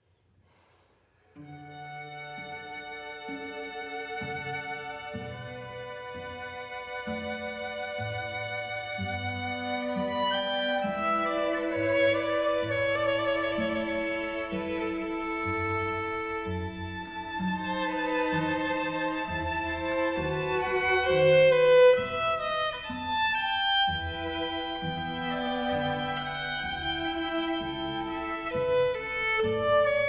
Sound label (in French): Aria de Bach en quatuor